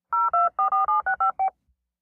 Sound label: Cell Phone Speed Dial, futz touch tone; car & cell phones; touch tone dialing